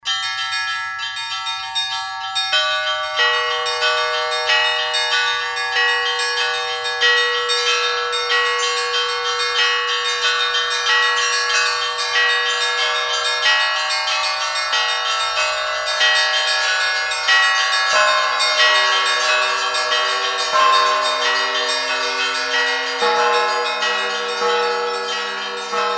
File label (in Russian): Prazdnichnyj zvon; vo vsja tjazhkija